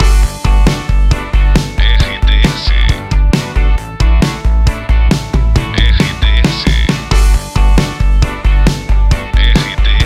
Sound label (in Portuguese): trilha para locução; Difusora FM